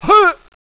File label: pain